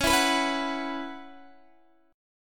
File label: C sharp Minor 11th C sharp, Guitar DADGAD